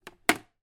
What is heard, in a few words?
household plastic mop bucket handle drop down